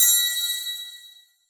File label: gamble level up; Butterfly Lovers; wazdan